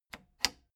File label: plastic button latch loose click disable slow